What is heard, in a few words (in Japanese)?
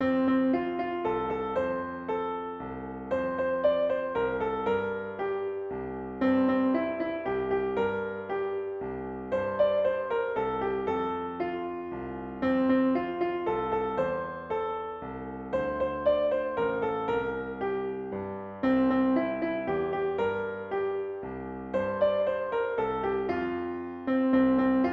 ピアノ